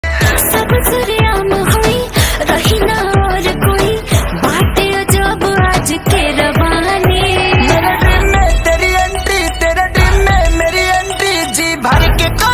bhojpuri ringtone

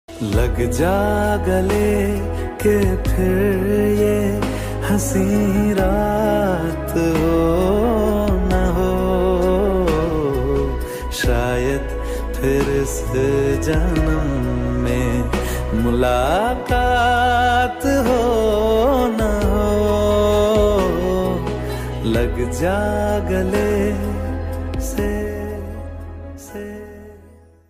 male version hindi ringtone

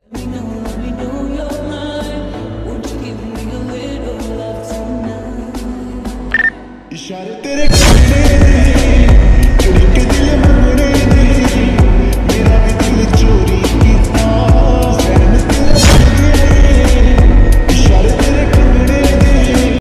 slowed reverb ringtone

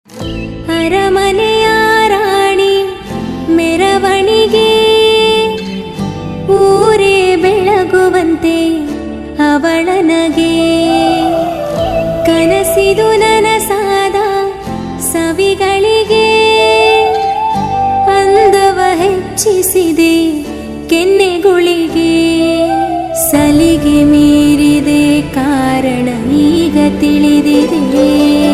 tamil ringtone

